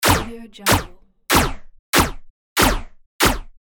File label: rapid laser gun